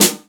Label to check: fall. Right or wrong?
Wrong